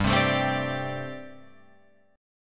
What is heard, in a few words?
G Suspended 2nd Suspended 4th G, Guitar, Open F; Gsus2sus4; strummed; 7,7,9,7,10,9